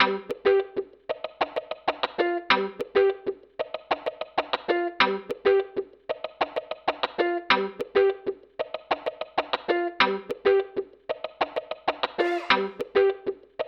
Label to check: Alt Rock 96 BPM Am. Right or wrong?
Right